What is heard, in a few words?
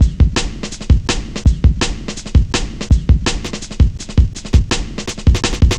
Cut Thru B, Drum Loops, Jungle, 165.5; Zero-G Jungle Warfare 1, 2 & 3